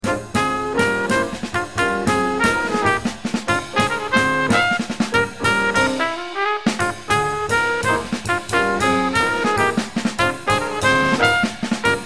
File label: minus Bass; tacet for the drum intro